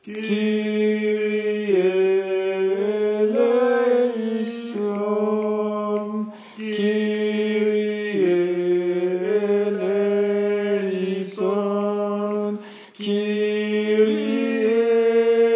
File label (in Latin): kyrie, ténor